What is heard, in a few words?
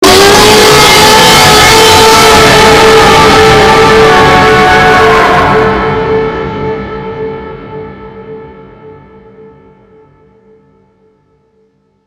Jumpscare Sound